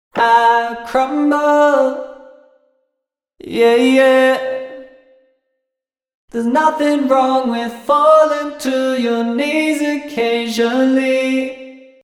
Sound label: Chamber Reverb